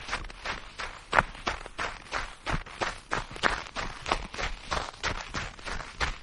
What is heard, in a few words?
snow crunch; Games by ANGELINA; A Christmas Present